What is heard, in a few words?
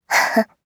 laugh, JPN; Voice lIne; Eternal Return; Katja